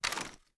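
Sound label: weapon foley assault rifle drop quick; gmod; viper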